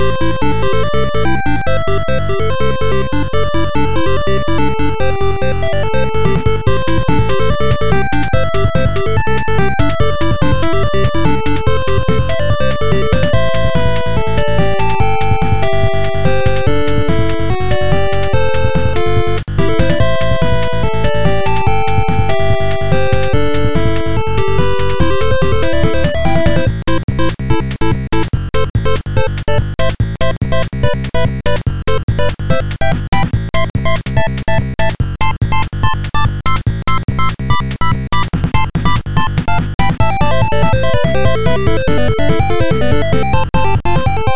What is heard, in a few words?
8bit